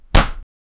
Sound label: punch on over, body impact